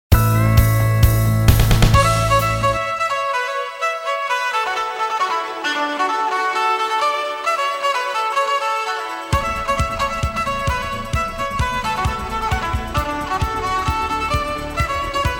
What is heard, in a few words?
Fiddle